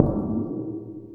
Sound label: Steel Sheet Bent Mallet; Sonic Foundry (Sony Creative Software) - Ambient Atmospheres & Rhythms; Ambient-Metals